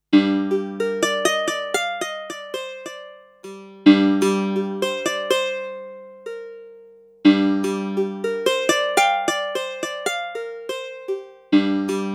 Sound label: Kurzweil SP7 Sitar